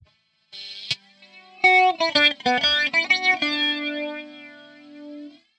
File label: flanger